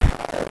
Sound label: Torpedo; Space-Smash-Out; SoundManager Demo